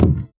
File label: Moody Park Playground Perc; Houston